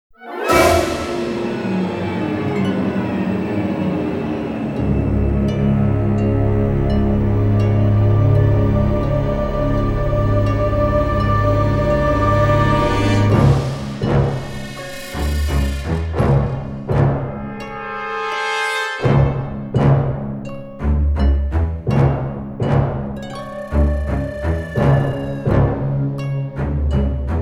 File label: Horror